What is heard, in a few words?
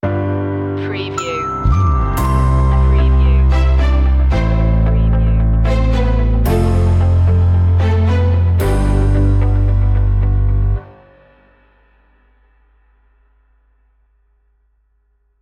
happy go lucky stinger